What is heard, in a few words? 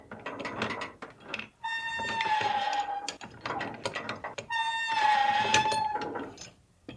Door Secret